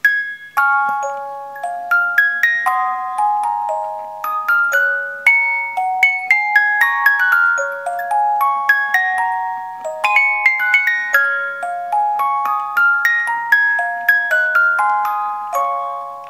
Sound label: 18 lames, boite a musique; Boîte à musique en bois personnalisable